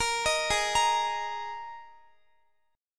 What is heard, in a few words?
Ab Suspended 2nd Guitar; arpeggiated; Absus2; x,x,20,20,20,17